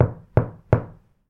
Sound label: knock on floor; knocking